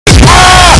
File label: screaming; shaq